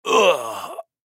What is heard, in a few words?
Human Grunt; Grunt Die Last Breathe Element Male 25 Yrs; Blastwave